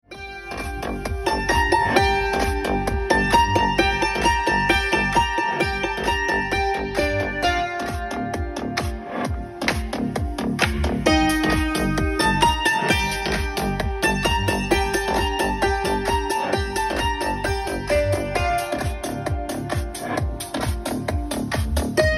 cymbaly

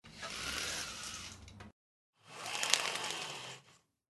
zvuk zhaliuzi

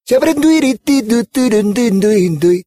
zvuk peniya

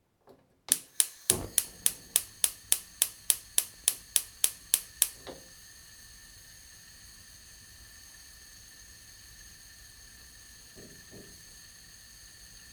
konforka, zvuk gazovoi plity

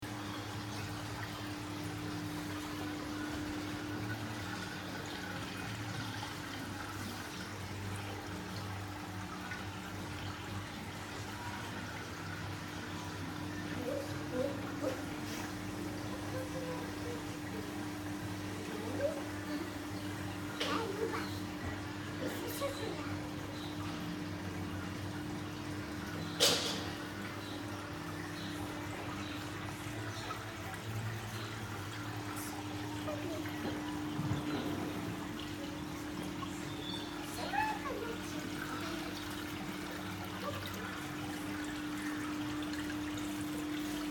zvuk avariuma v zoomagazine

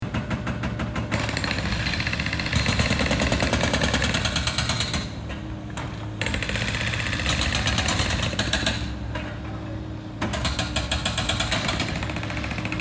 dor raboty, zvuk dorojnyh rabot

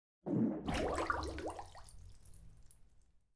puk v vannoi; Издавание человеком неприличных, но естественных звуков портящих воздух

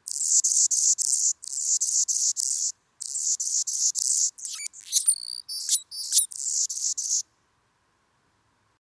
kolibri

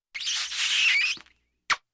pocelui

